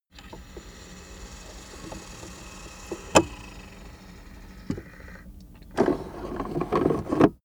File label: car accessories center console display retract; autos; verkehr transport